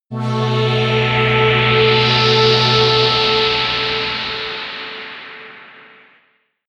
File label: air trumpet wind high, drones